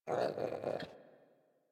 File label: Puppy Idle Growl Playful; Enemies; Vocals; Kubrow; Warframe